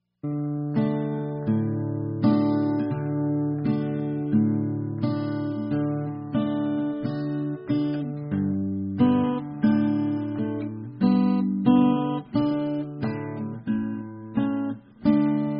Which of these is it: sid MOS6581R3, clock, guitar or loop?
guitar